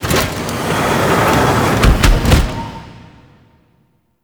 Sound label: Machine Multi Door Close; Orbital Station; Caliban; Warframe; Tau